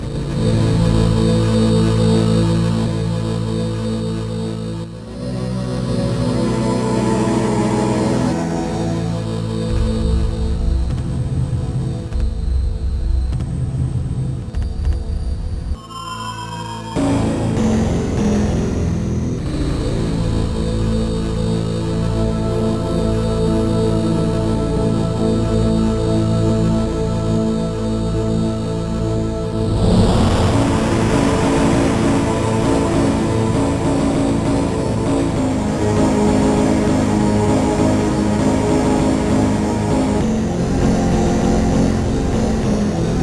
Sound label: ambiance; MED; Highlander